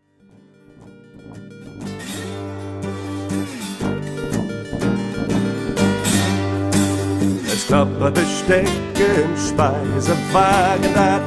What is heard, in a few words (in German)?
Shuffle